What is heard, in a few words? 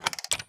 landmine trigger; Rust